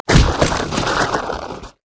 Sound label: feast; LoL; dandychogath